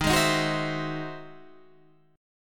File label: E Augmented Major 9th